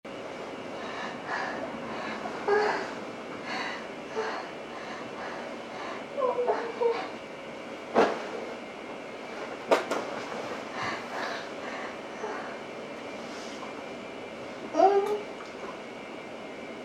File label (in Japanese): サンプル音声 もうだめ… 乳首舐め